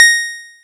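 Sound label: Boss DR-202 percussion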